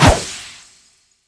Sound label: shoot; transformgunadv